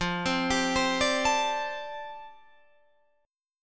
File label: F 6th Add 9th F down Guitar Drop D, chord